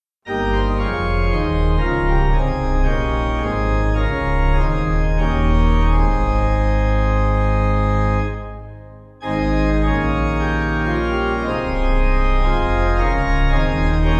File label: Pipe